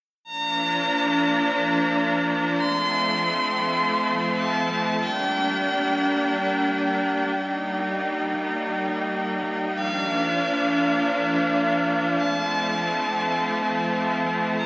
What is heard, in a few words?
432 hz